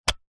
household mains power plug pull out remove from extension block